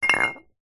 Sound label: glass bottle 1 ltr set down lay down on side tiled floor